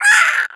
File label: mage book die